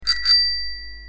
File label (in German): Fahrradklingel; Sankt Christophorus